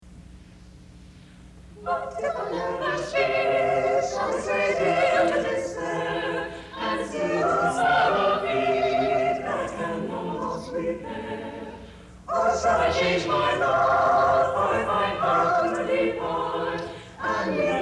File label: Singers; Renaissance; What If I Never Speed